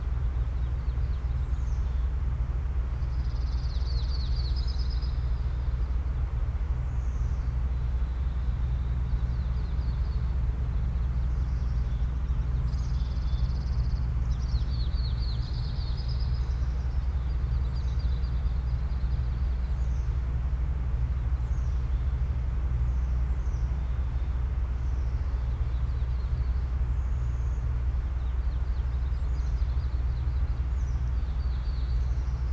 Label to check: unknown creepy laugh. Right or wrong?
Wrong